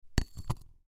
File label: Bowl Pick Up Glass; Blastwave